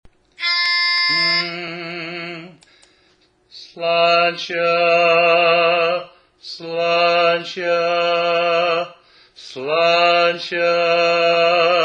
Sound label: Slainte (Bass)